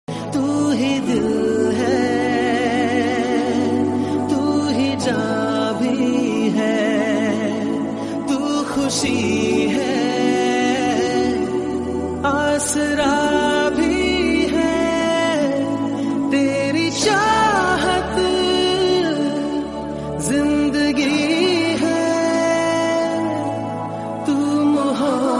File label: Hindi Song